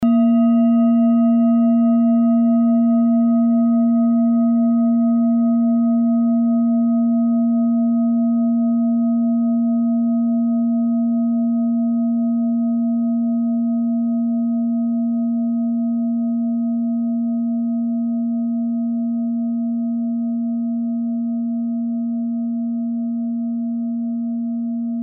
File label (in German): klangschale ladakh